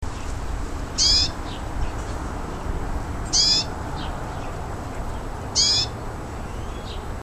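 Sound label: S curruca fledgling call, background Pas mont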